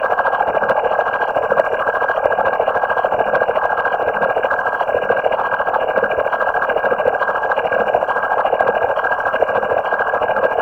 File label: Aftertouch Engage Loop; Warframe; Ranger